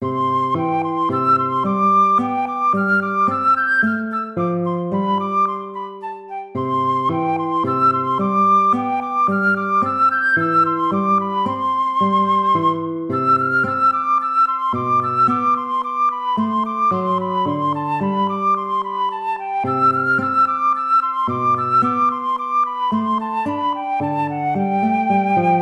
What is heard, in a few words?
tempo minuetto; hook james